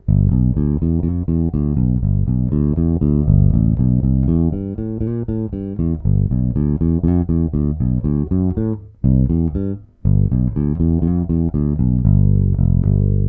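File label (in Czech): Warwick RockBass Infinity 5 Fretless, Thomastik JR345, nic - jednocívka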